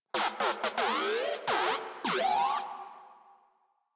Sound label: Moa Compassionate Vocal Battle Ends; Warframe; Pets